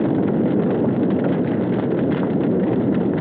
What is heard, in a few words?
EXT fire, Vehicle; NASCAR Thunder 2004